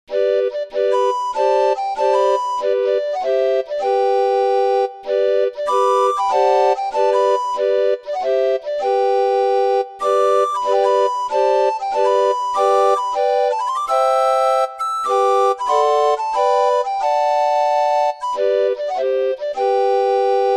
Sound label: Coming through the rye, orgue barbarie